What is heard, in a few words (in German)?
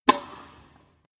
klingelton tennis